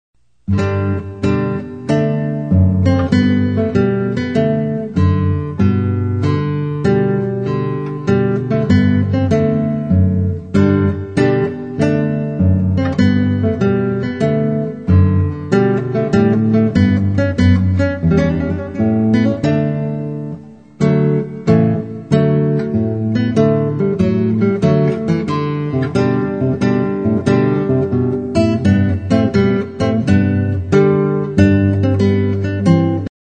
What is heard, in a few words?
trumpet tune